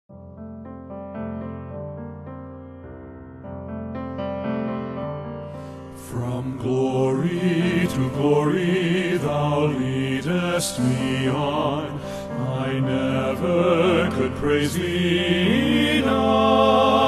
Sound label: men's chorus